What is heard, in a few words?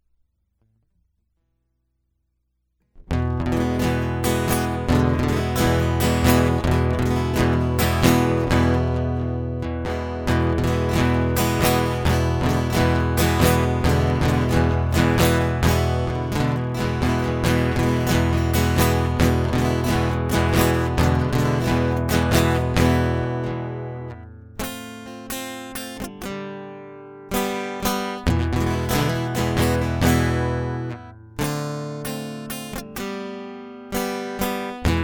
Feast Guitar Loop